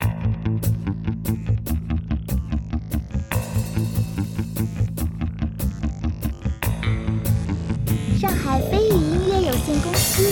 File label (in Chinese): 电子激情登录主界面